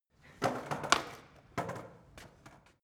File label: skateboard indoors handling